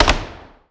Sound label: STEP, SINGLE STEPS; Best Service; World Colours